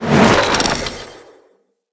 Companion Jump Whoosh, Foley; Pets; Zanuka

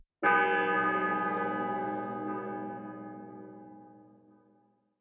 Intro Bell; Warframe; Cinematics; DuviriQuest; Courtyard

